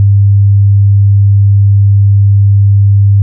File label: low sound